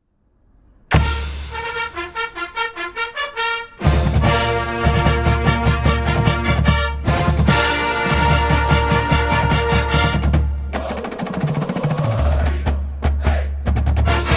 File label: fanfare